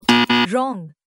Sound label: wrong